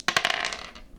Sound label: roll; Quentin C's Gameroom